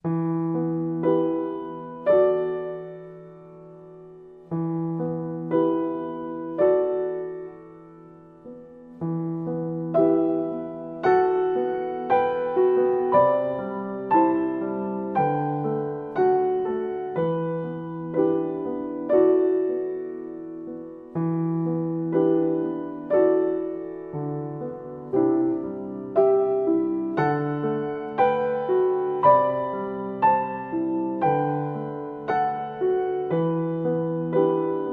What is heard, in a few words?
Solo Piano